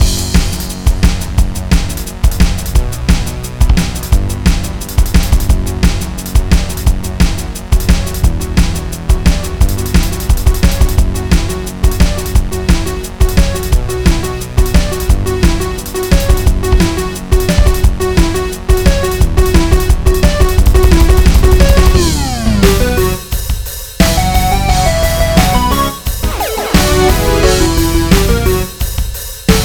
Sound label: Dubstep Speedway; Android